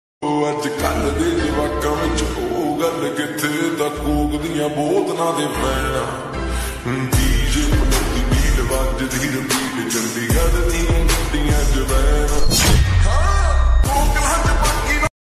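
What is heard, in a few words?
slowed reverb song ringtone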